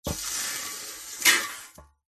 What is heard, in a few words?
food lemon slide drop onto hot metal sizzle; zapsplat